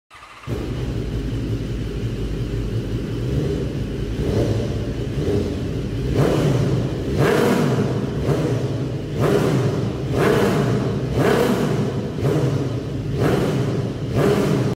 Racing exhaust

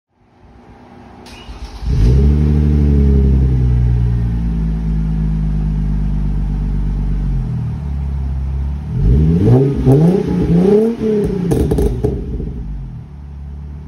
Genesis coupe

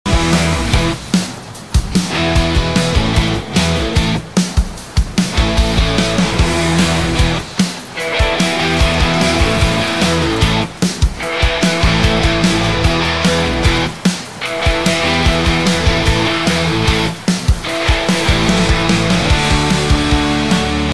Guitar and rain

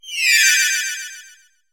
lose fairy shine; mixkit

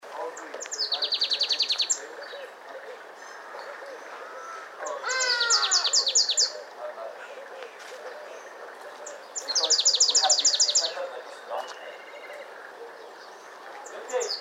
Variable sunbird

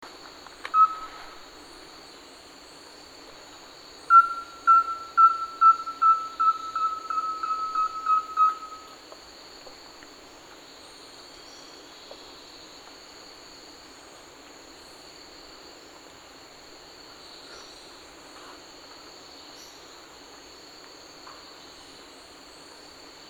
Rusty belted tapaculo

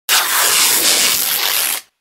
tearing

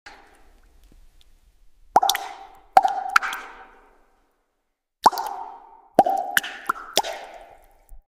reverberation stone space; عمق ریورب خفیف

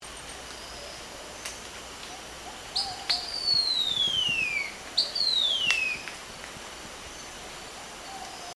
Heterocercus, پرنده

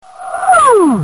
Zoom Music

